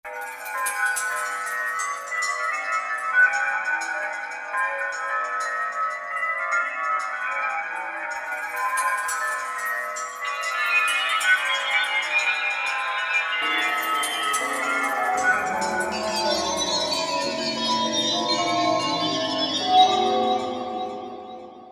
Crack; Korg MSC-14S Sound Effects

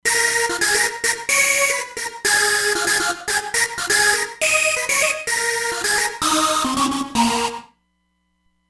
Noized Lead, Roland Fantom XR

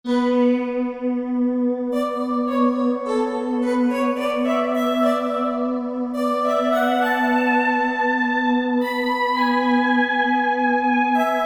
Cold Voice, Synth; Synth 3

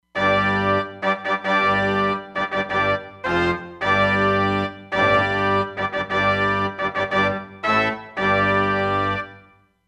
Simple Tutti

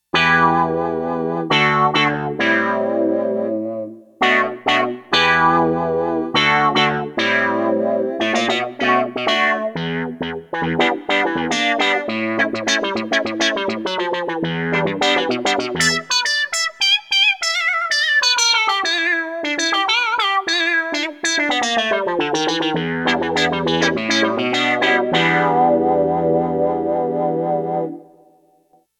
SWEEP, Roland JX-1; Factory presets

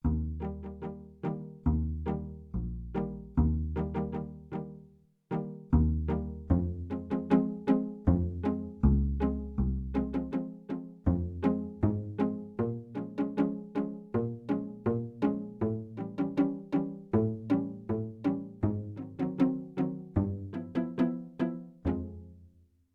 Stereo Dual Pizz; Yamaha TX16W

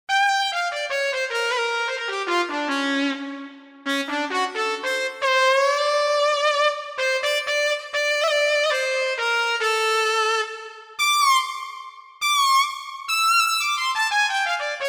Saw Trumpet, Korg MSC-1S; Korg MSC-1S Variety

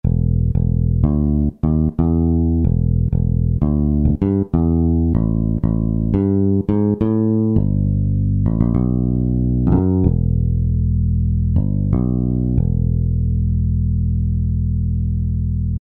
E Bass sustain, Kurzweil K2000; Kurzweil K2000 Value Pack; Extra Programs

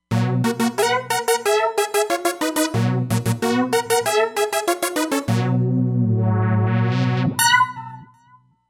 Acid Lead, Roland Fantom XR

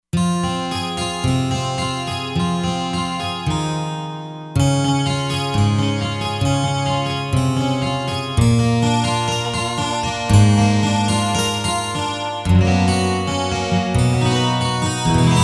12 str Gtr, synth

